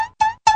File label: shoulder tapping doinks